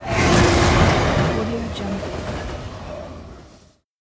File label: a train pass by